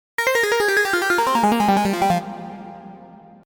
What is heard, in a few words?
Synth F minor Arp